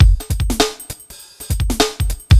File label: drloop, drumloops, Vinyl Attack; Masterbits